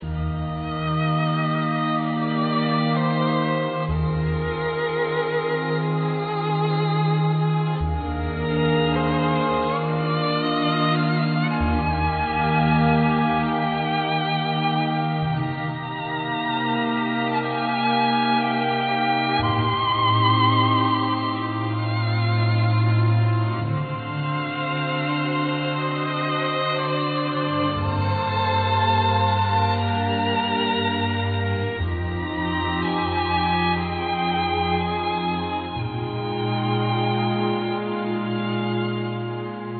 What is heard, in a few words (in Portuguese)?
Musica